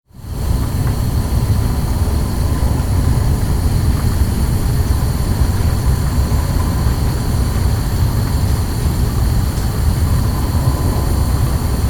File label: atmos Burn